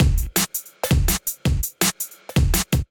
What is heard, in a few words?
Kit 165 bpm, drums; MIDI Clips; Youngstar Kit; Ableton; Factory Packs; Skitter and Step